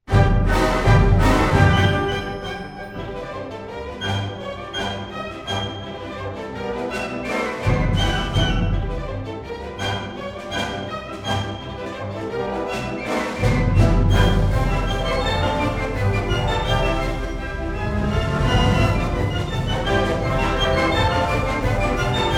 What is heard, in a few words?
Polka schnell; Johann Straus Enkel